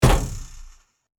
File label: hit